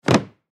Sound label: Garbage Can Large Lid Close; Household; Foley; Blastwave